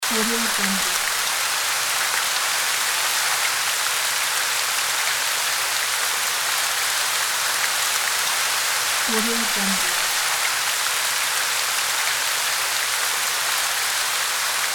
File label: heavy rain fall loop